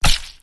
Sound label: splash; openspace3d; vrcells